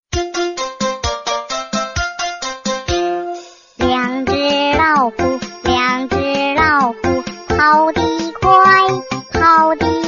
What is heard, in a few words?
chinese lullaby, ringtone